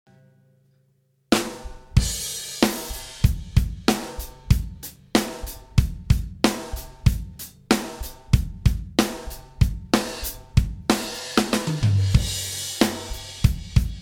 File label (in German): drums mit fx